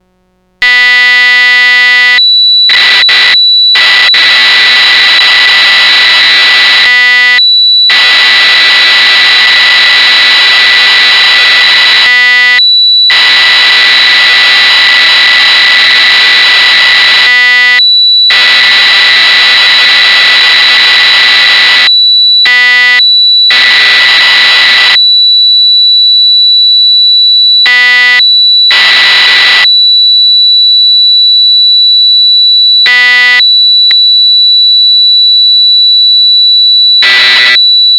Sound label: DelayDist FX; Play this file into CV in; SDS VCO